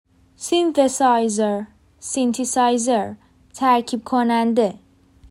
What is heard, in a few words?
synthesizer